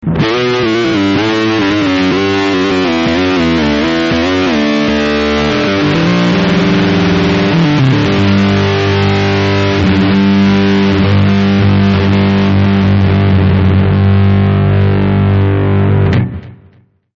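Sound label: heavy high gain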